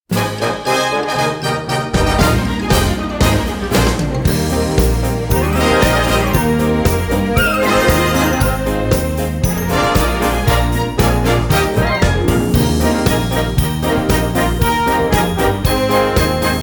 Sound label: Jingle Bell Medley Jingle Bells no vocals; Sawgrass Elementary